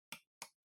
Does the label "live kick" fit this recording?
No